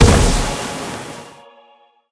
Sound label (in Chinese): Bomb barrel spell, 炸药法术爆炸音效; 法术音效 spell; 爆破麦克; 绿野世界; 荒野乱斗